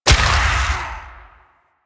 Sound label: Rupture Impact C; Warframe; Warframes